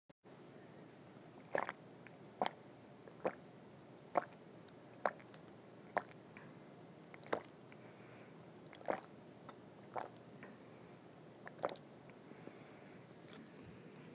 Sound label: chugging water